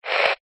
technology radio weak signal buzz static very short burst